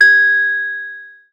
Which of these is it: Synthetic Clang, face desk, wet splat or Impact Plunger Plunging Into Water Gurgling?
Synthetic Clang